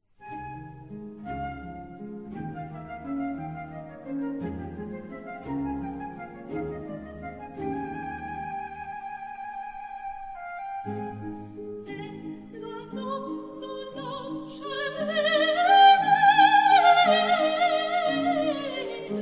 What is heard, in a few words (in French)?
les oiseaux dans la charmille soprano; Contes d'Hoffman; Jacques Offenbach